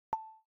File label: Volume control; Samsung; Galaxy S25 Ultra; ui